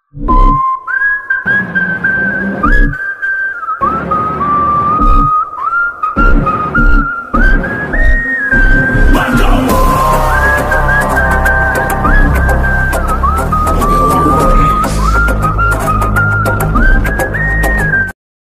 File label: bgm ringtone whistle music; marco movie